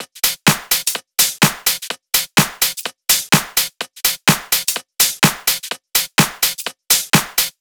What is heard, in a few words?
126BPM Drums; Vengeance Samples; Inception Kit